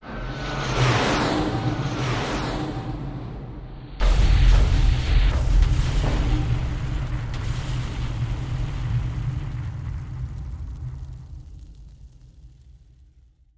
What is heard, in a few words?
airstrike, fighter jets